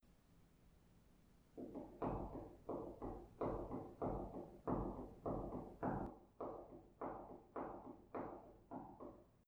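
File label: surippa, slippers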